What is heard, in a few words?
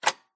household electric pay as you go meter remove plastic key